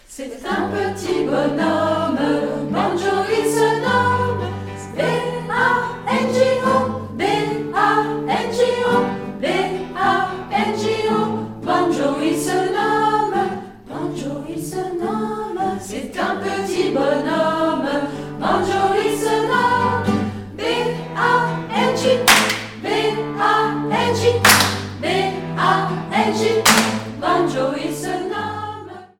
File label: banjo